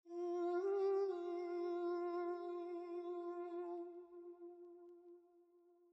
vox, 110 BPM G Min; Cyprus; Cymatics

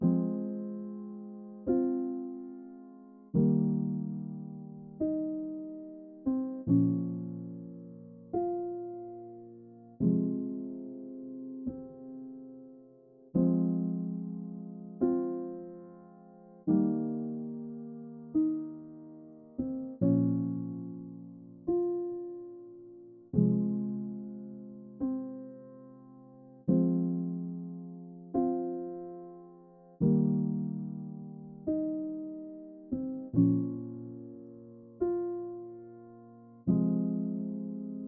144 BPM F Min Piano, Hip Hop; Melody Loops; Cymatics; Gracias

